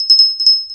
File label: moonlight move; Fight